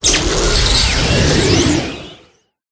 werewolf

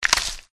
scorpion attack contact

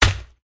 elf attack contact; boss

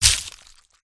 werewolf attack contact up

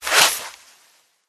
crab attack contact up

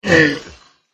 cristal golem wound

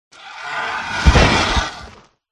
mini dragon death

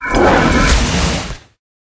Rat

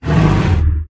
black bear wound

